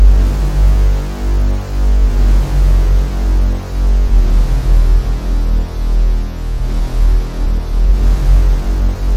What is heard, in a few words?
Ominous Loop